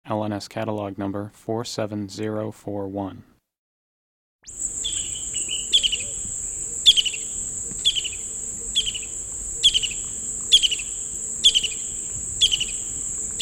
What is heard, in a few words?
brown hooded kingfisher